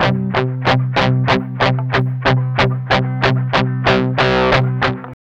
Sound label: MED ROCK 8TH C#3, Guitars; Spectrasonics; Hans Zimmer Guitars VOL-1; 102-MED ROCK